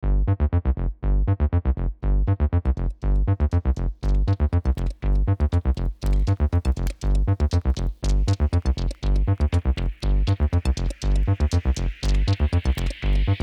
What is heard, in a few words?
bubbly troubly